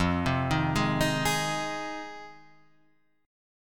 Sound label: Ab 6th F